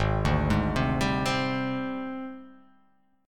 Ab Major 7th Flat 5th Ab, down; arpeggiated; JGuitar

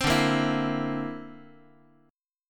D 7th Suspended 2nd Sharp 5th D, Guitar; D7sus2#5 strummed

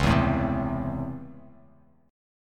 Db Minor Major 7th Sharp 5th, Bass Standard; DbmM7#5 strummed; JGuitar

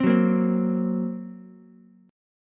Gb Major Flat 5th, down, Ukulele; strummed; JGuitar

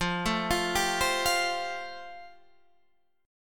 F Major Add 9th, Guitar Drop D

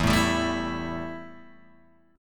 E Minor Double Flat 5th E Guitar Open G

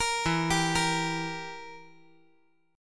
E Diminished down

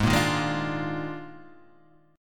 Eb Major 7th Suspended 2nd Suspended 4th

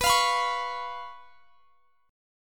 B Suspended 2nd Flat 5th; Bsus2b5; strummed

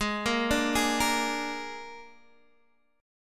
G sharp Suspended 2nd Flat 5th, Guitar Open D; x,11,8,8,11,8

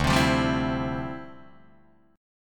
D Minor 11th D down Guitar Drop D

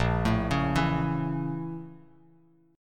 A sharp Minor Major 7th Flat 5th, Bass Standard; arpeggiated; 6,12,11,9; JGuitar

